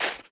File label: snow; The Sum of All Fears